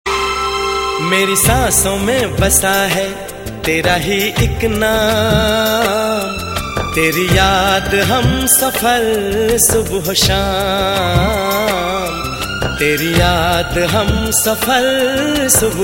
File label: Hindi Sweet Ringtone